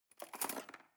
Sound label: cutlery cake fork drawer scrape